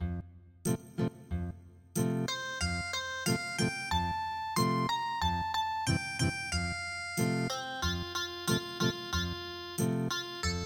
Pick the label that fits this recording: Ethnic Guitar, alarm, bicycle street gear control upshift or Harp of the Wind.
Ethnic Guitar